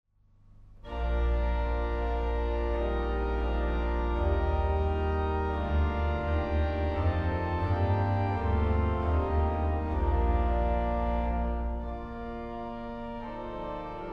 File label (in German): Praeludium, orgel; Aulfingen; kulturerbe orgel